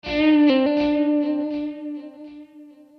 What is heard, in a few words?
C4 93 solo